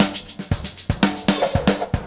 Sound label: fx, Beat Loops, 116; Zero-G - Monster Beats